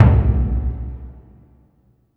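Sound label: Burundi drum, Heart of Africa; Spectrasonics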